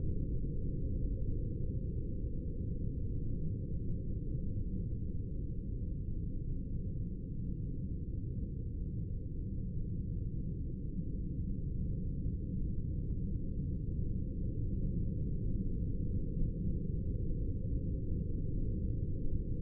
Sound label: cave AMB